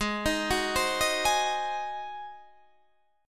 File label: G sharp 7th G sharp, Guitar Drop D